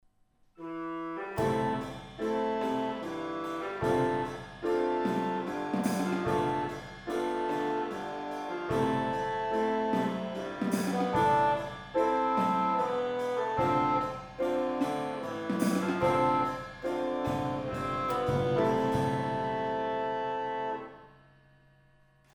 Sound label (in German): waltz; DreiGroschenoper; rehearsal